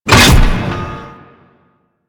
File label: Thumper Bot Jump B; Warframe; Grineer